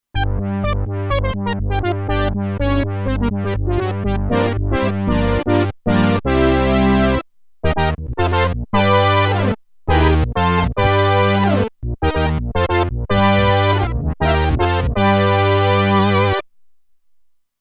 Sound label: Fat Brass, Roland Alpha Juno-2; Original Sound Chart patches